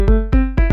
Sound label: bling